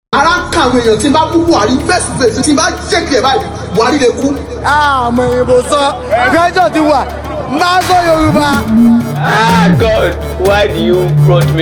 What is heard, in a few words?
Drums